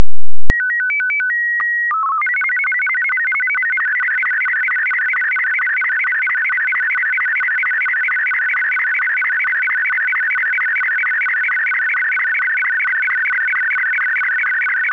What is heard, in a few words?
Martin 2, SSTV